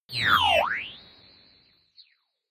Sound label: notification fly by synth